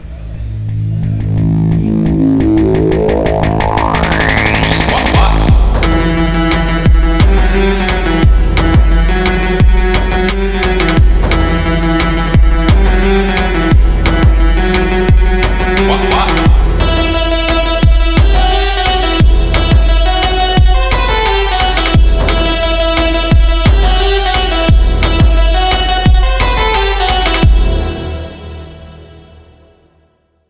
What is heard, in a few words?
wah wah